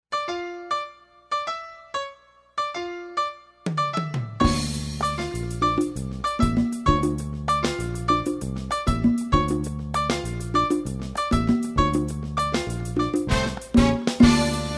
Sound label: Latina